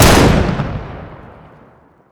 slamfire shoot; tf2classic

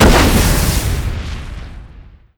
recoilless explode; tf2classic

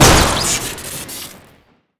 1911 shoot crit, weapons; tf2classic

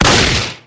sure shot shoot, weapons; tf2classic

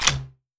super shotgun shells in, weapons; tf2classic

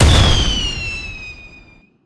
shoot; heater; tf2classic

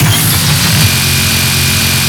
wind up; weapons; tf2classic

